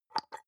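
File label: soap dispenser press almost empty soft, bathroom; haus-garten; badezimmer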